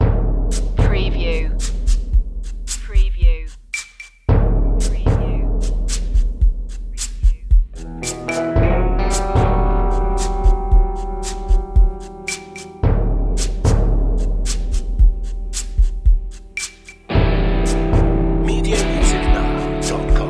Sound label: underscore